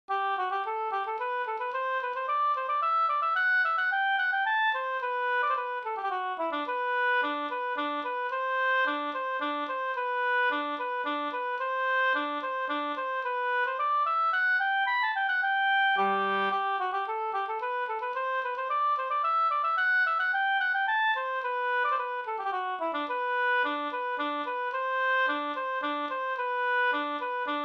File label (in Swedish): slangpolska